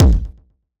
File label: Kick, Yamaha PSR E305